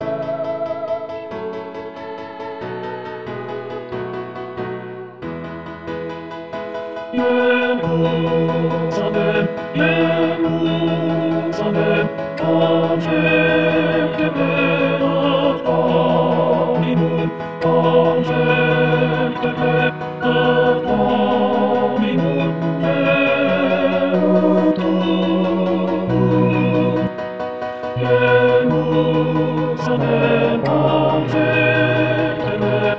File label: tenor; tenor 1; jerusalem